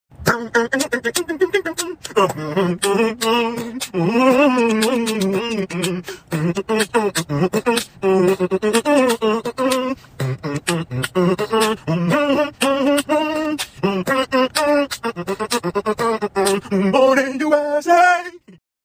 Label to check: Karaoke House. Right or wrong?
Wrong